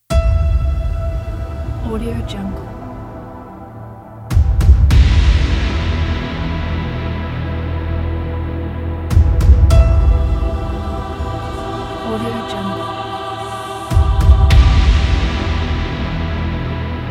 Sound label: epic journey movie trailer